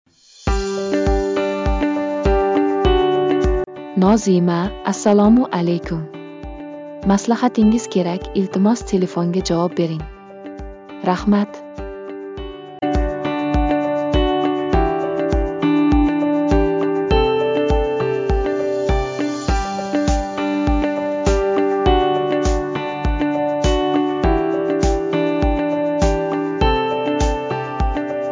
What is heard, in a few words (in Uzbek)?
zvonok